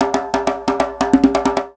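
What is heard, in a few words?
djembe toot; Musical Instruments; africa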